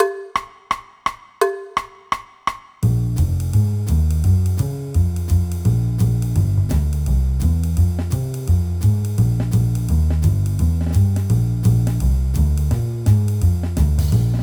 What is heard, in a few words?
Piano Playalong